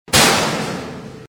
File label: locker; chatsounds